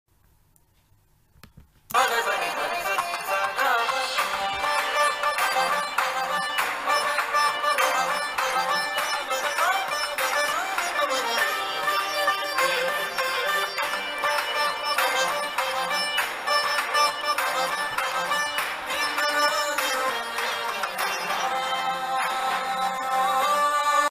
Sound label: love bgm; allu sirish; anu emmanuel